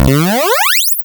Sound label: pickup